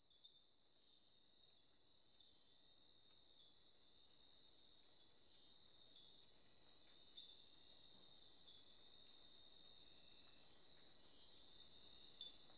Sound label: Tink Frogs House Gekkos Monteverde